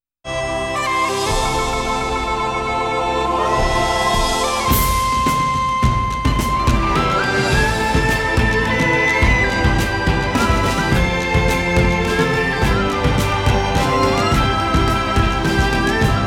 Instrumental Theme; Mystic Knights of Tir Na Nog; Fox Kids Countdown

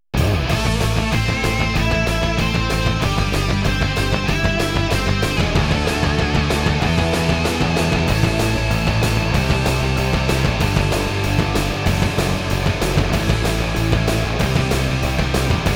Instrumental Theme; Power Rangers in Space; Fox Kids Countdown

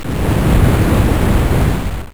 Sabertooth Tiger Footsteps; Saban

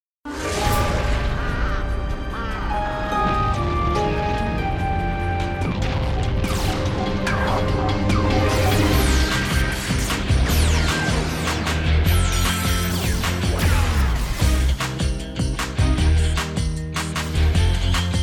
Instrumental; Power Rangers Mystic Force

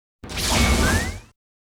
Morph Sound Effects; Saban; MMPR

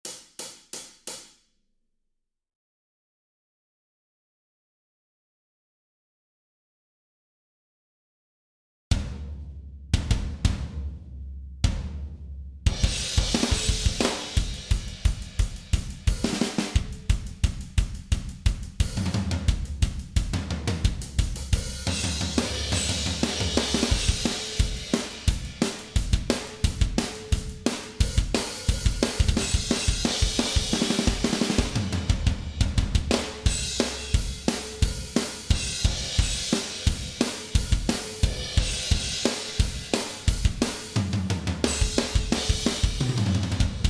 Drum Ref Only; Power Rangers RPM Demo Theme 02

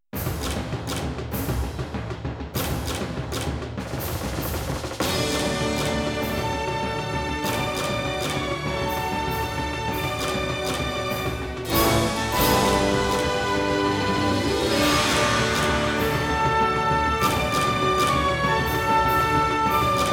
Instrumental Theme; Masked Rider; Fox Kids Countdown

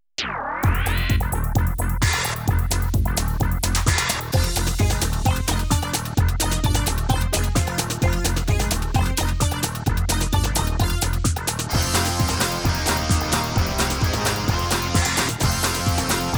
Instrumental Theme; Big Bad Beetleborgs; Fox Kids Countdown

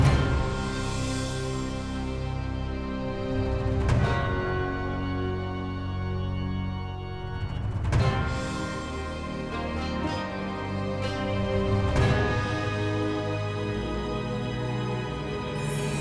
Battle Fail; Power Rangers Megaforce

